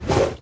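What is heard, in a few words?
throwing lasso around target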